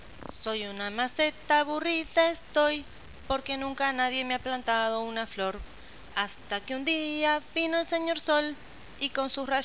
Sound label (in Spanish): cancion maceta